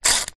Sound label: household water tap timer single turn wind